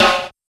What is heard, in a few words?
low acoustic snare sample f key; snare drum samples